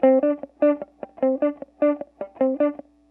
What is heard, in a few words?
Pop Guitar S-Note Dm, Electric; Pop Rock